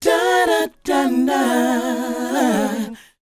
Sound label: DOWOP F#, Vocal R&B DOO WOP; ILIO; Vocal Planet